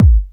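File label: dance bass drum, dance kit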